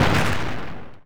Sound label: Noise Hit; Drums & Drones